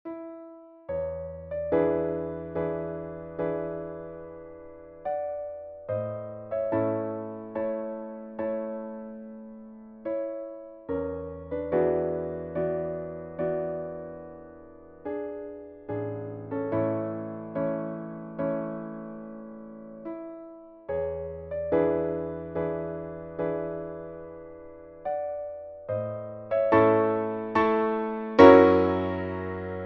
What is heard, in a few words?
A Major Chords; Chopin